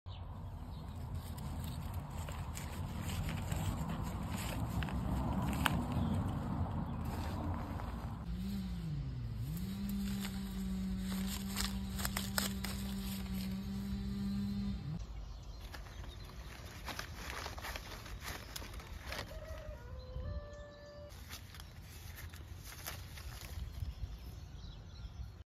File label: Picking fruit from tree; چیدن میوه از درخت